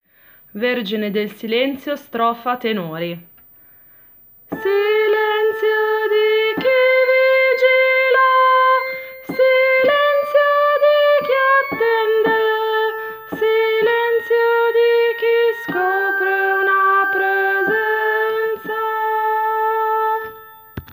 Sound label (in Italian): tenori